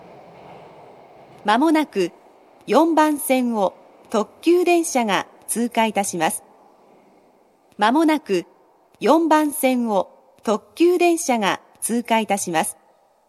pass limited express; hiyoshi; station

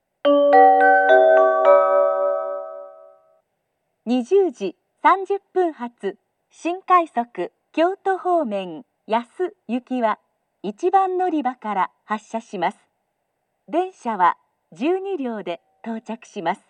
special rapid service; ashiya; yasu

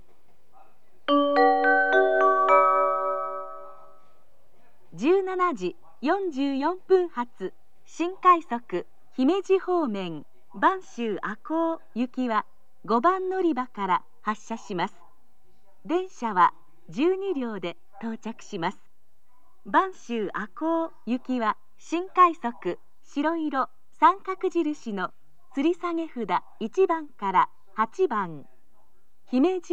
special rapid service, kyoto station; banshu-ako

